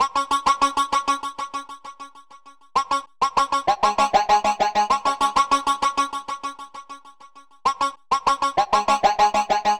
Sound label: Wobble FX; Sonic Foundry (Sony Creative Software); Club Joints and Street Anthems